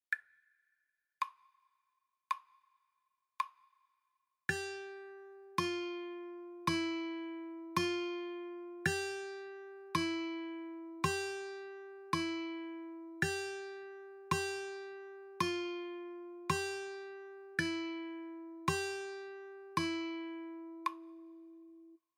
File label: E F G Practice, guitar